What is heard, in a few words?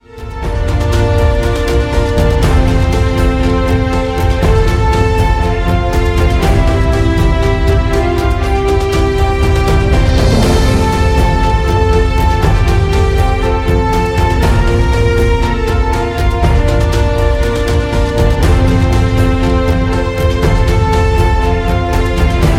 Epic; MIUI12